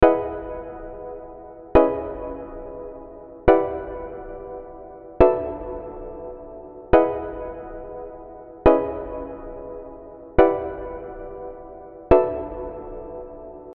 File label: piano; 4front